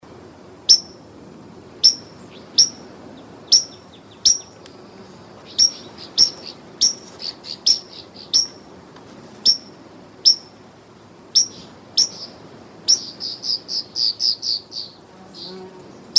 Northern Rough-winged Swallow, Stelgidopteryx serripennis; swallows swifts